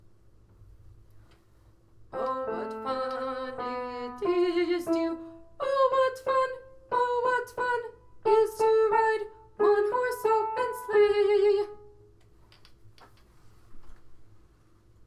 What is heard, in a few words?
soprano